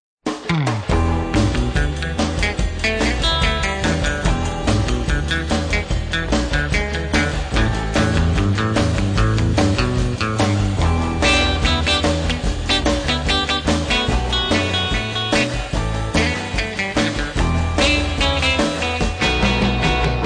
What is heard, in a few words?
drum riot